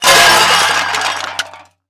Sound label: Metal Crash; Crashes; 1001 Sound Effects